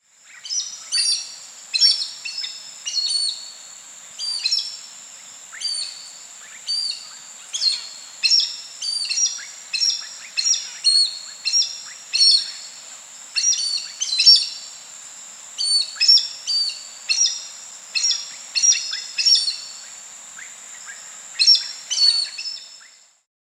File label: Chestnut-eared Aracari (Pteroglossus castanotis), Peru; S. America